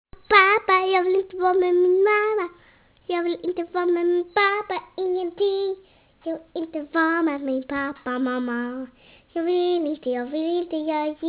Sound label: fanta song